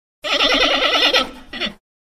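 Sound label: Monkey Titi Call Whine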